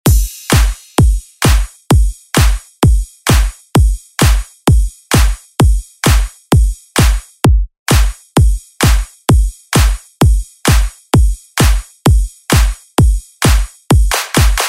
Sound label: Dance Bootleg; Deejay Hit